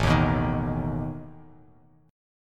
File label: A Suspended 2nd Flat 5th A down Bass Standard; Asus2b5 strummed